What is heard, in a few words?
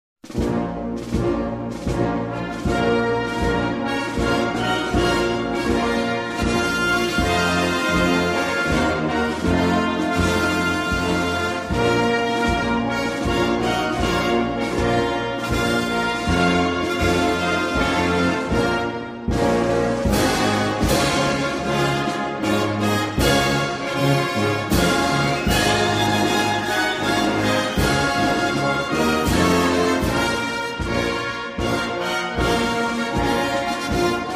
The Sacred War instrumental